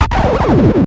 Vinyl FX and Scratches, FX Scratch

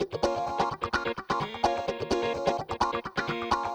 Electric Guitar 128BPM A# min; Vengeance Samples; VEH3 Electric Guitar Kit 2

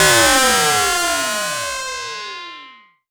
FX Downlifter

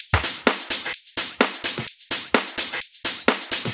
Minimal Loops

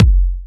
Bassdrum; Vengeance Samples

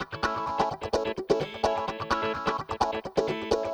Electric Guitar 128BPM A maj; Vengeance Samples; VEH3 Electric Guitar Kit 2

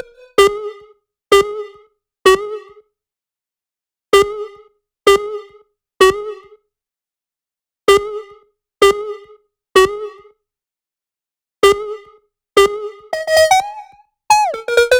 128BPM Melody; Vengeance Samples; Funkalicious Kit

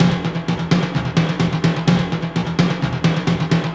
House Loop, 128 BPM; Vengeance Samples

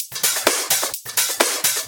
House loops 128BPM; Vengeance Samples

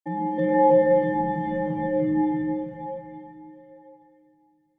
Pad; Dubstep Sample Pack

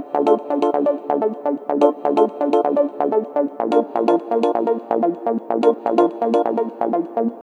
Melody 126 BPM Root D; Vengeance Samples; Melody Kits

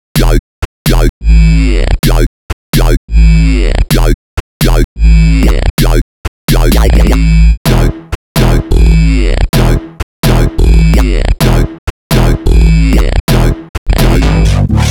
Melody 128 BPM Root E; Vengeance Samples; Melody Kits

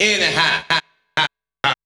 House Loops, Vocal Loops House 128BPM

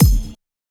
Kick; Dubstep Sample Pack; BassDrums

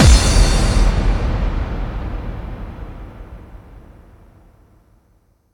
Bassdrums FX, FX Kicks; Vengeance Samples

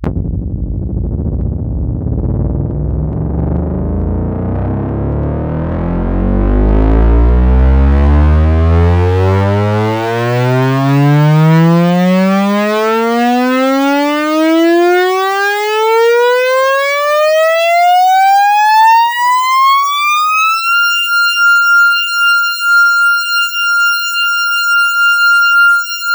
Sweeps Long

